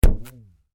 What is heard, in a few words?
Cartoon Rubber Band Stretch Twhap; Miscellaneous Cartoon